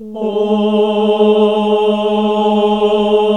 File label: "Ooh" G#1, classic vox